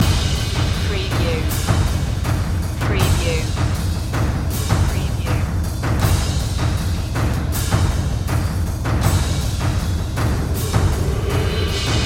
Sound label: lords of chaos percussion loop